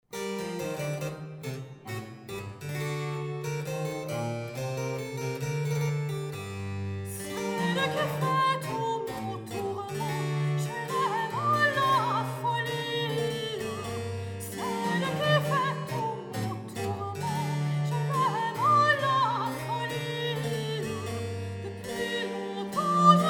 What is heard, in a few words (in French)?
baroque couplet soprano; airs sérieux et à boire